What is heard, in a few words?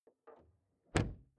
car door close exterior hummer h3; Cars